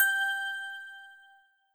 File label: bell